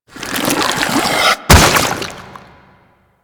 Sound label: Idle Agile Power; Warframe; Hydra; Saryn